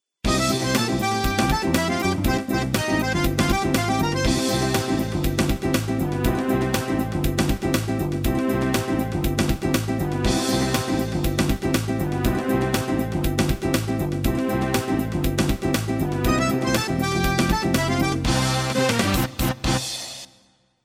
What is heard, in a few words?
music twinkle jingle